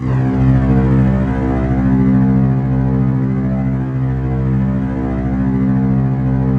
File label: C1, Roland JV 2080 TECHNO PAD